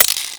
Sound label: 357 spin